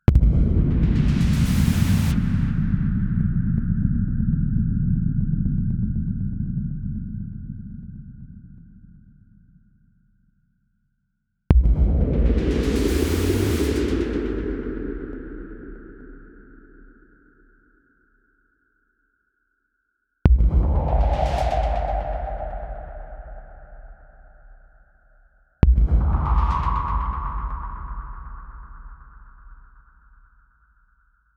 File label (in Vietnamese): Am thanh bau khong khi u am kinh di